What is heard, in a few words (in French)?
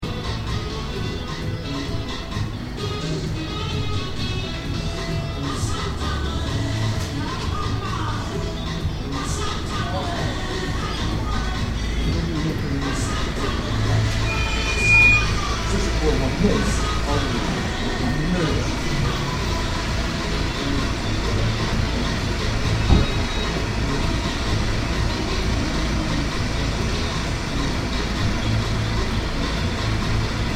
un cafe africain; liege; 2009 deambulations